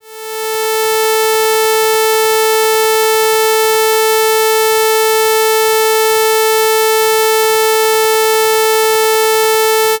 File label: frequency 3150 hz most an, alert